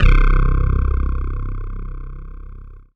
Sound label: Dance Floor Bass, Multisample Basses